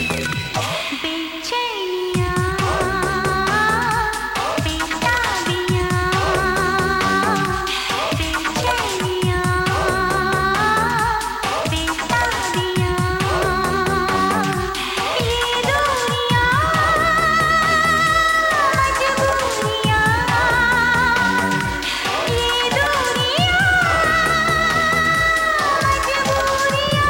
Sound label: Old Romantic Hindi Ringtone